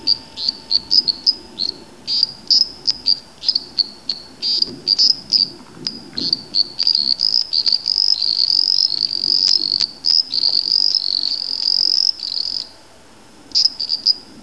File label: Hyla microps